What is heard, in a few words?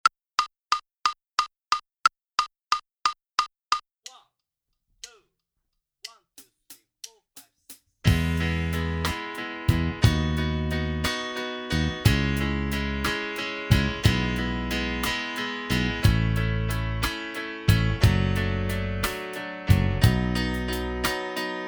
Minus Guitar; Inst Ensemble